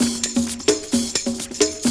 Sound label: 130 percussion; Sound & Vision - Gigapack I